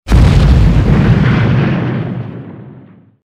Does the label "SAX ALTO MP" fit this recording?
No